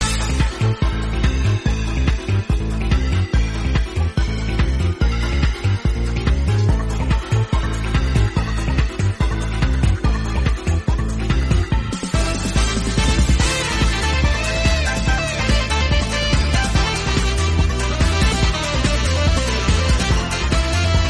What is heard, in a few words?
sax solo bgm; metallic madness act